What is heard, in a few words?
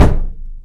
wood crate impact hard